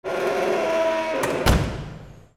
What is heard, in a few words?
DOORS